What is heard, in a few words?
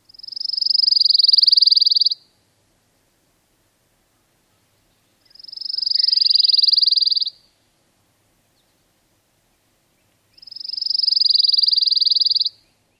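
pine warbler fast pulse-rate songs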